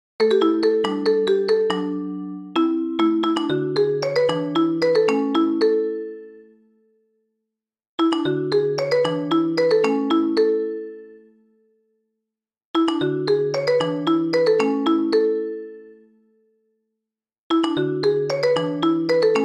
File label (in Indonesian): Nada Dering iPhone x Nokia